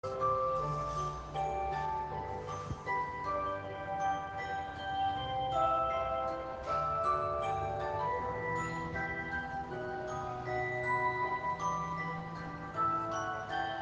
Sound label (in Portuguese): Sinal OK